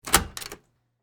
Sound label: Door Open Hotel, Foley, Door, Open, Hotel, Room; Blast Wave